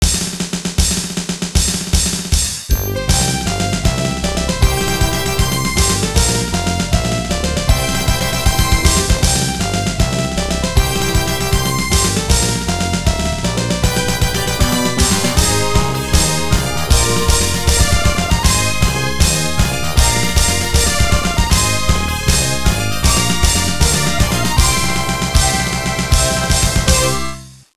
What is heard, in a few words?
A Victory March; arcade